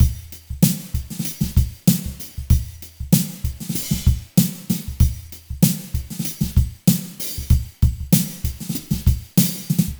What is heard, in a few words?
Drumbeat boost; Mixter Plus Drumbeat